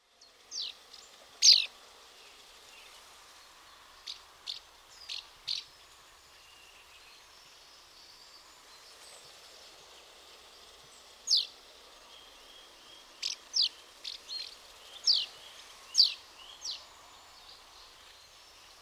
house martin

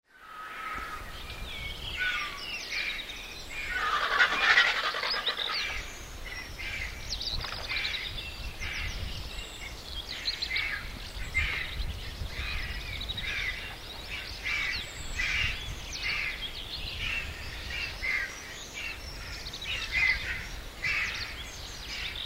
griffon vulture

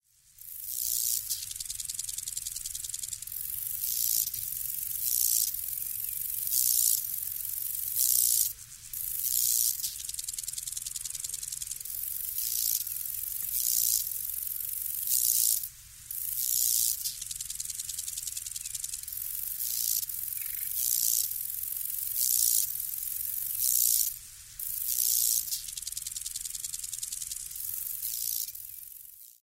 lesser marsh grasshopper

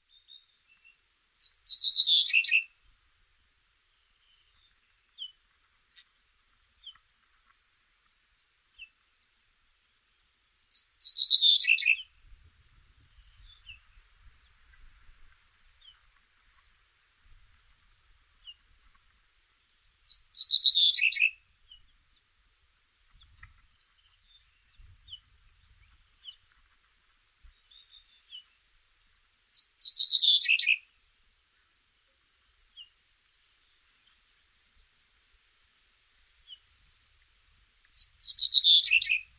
cinereous bunting

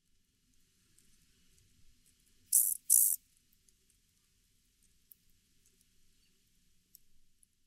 mottled grasshopper

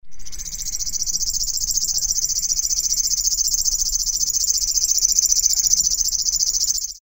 river warbler; pogorzelce

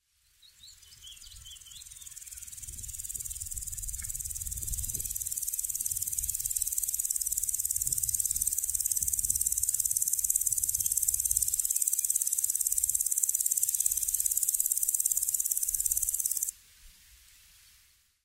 common green grasshopper

